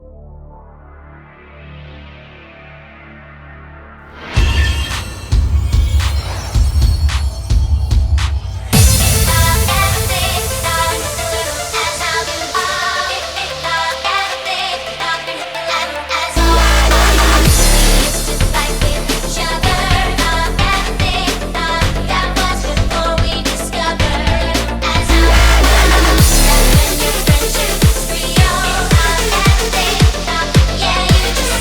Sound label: Drumstep Remix